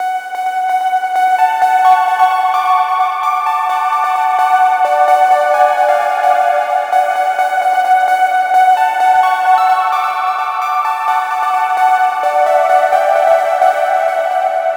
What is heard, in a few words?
Lead Cheesy Melody F#m 130; Keyboards